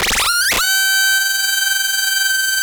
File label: camera; AKAI; FX SIGNALS